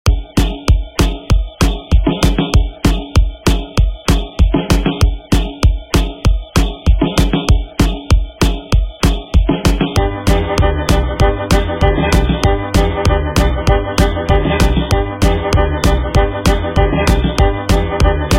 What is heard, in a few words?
50s Grid Redrum 194Bpm